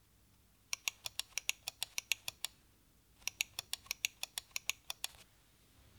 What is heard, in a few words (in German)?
Schultertasten